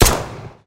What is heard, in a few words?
shoot